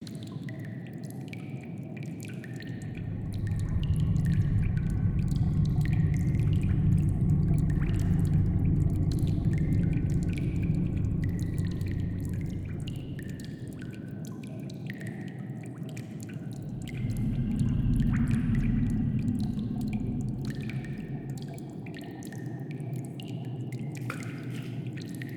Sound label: lair ambiance; basilisks